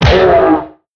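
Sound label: entiwood damage; monster; Senillinea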